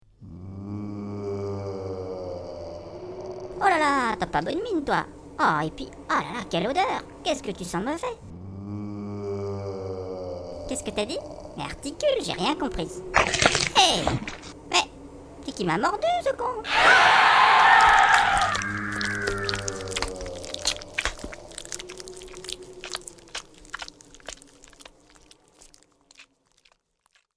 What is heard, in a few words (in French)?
mort zombie; doom; Totalement inutiles, ces fichiers retracent la mort de marines par certaines créatures...